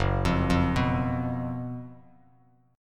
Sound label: F sharp Major 7th Suspended 4th, Bass Standard; F#M7sus4 arpeggiated